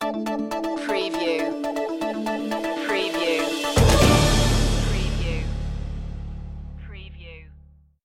newscast music

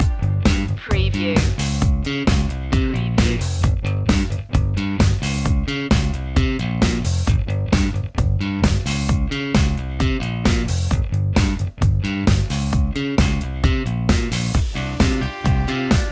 dirty disco loop

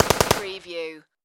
Machine Gun AK-47 Short Burst Close Perspective

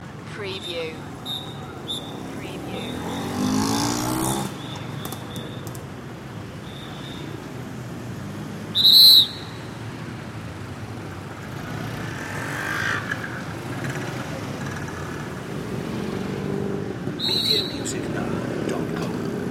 chaotic asian street crossing loop

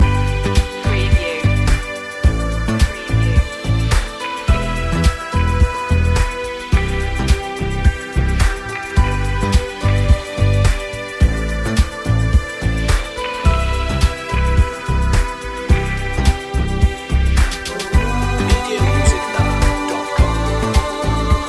loop

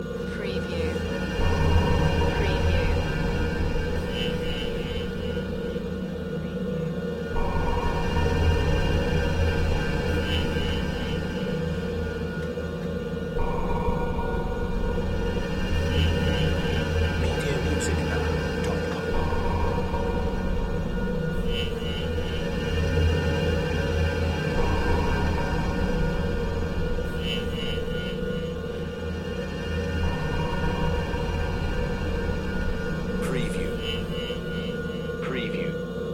Tension loop

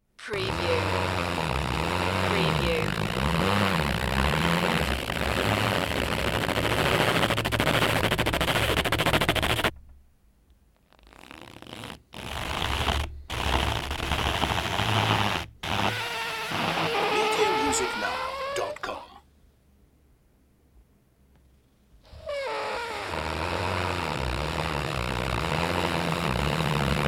squeaky rubber slat

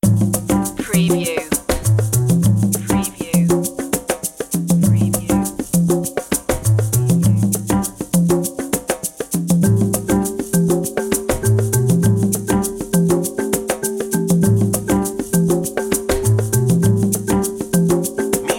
jazzy opener underscore

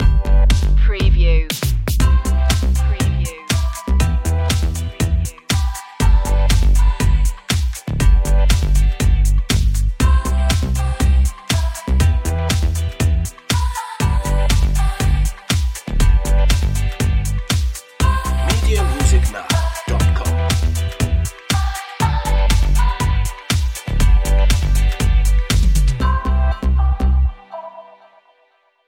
chic night

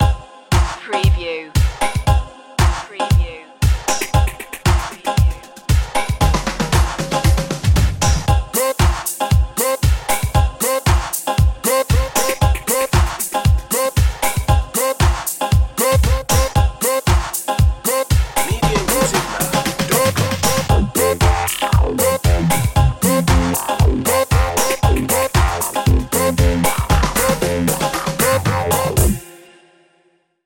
electro ghost

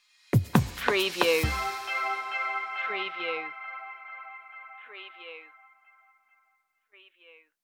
tones of aqua stinger

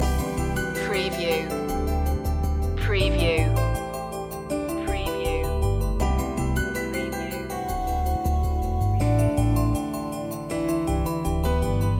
loop, chill

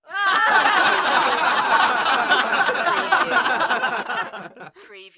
Crowd Laugh

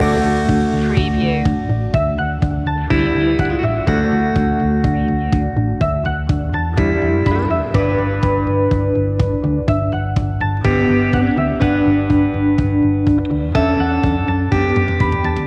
starting something new loop

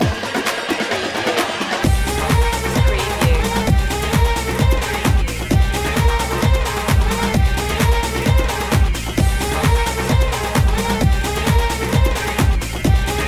beat

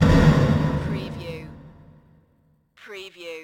DUNGEON DOOR SLAM

